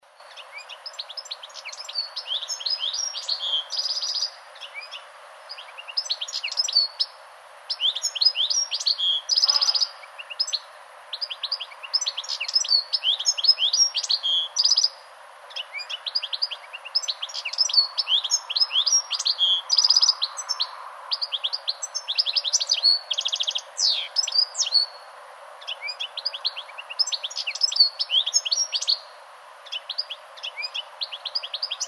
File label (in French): Chardonneret, chant